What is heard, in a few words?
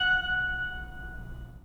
Pipe Mix Celeste, 3D Organs; E-MU Producer Series Vol. 5 – 3-D Audio Collection